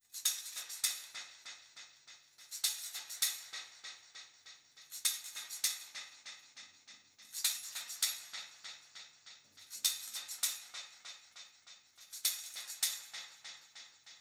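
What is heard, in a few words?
tambourine processed with fx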